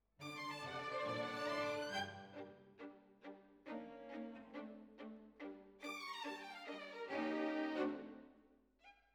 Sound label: Violins Over